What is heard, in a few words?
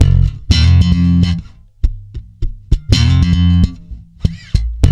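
F, funk swing, bass; Spectrasonics; Bass Legends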